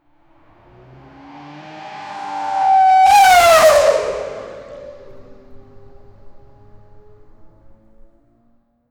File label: a quick sharp recording, sportscar